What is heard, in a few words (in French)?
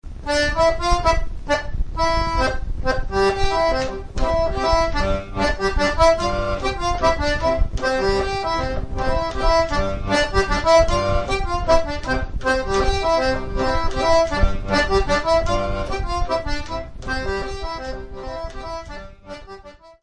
Mazurka - France